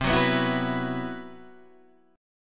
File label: C Major 7th Flat 5th C, Guitar Standard; CM7b5 strummed; JGuitar